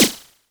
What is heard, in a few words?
Clap; Noise Engineering Basimilus Iteritas